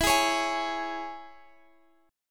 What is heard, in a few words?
G sharp Suspended 4th Sharp 5th, E